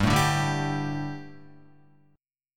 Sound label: G Suspended 2nd G down Guitar; Gsus2; strummed; JGuitar